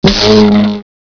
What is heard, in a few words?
field impact; gearbox; flier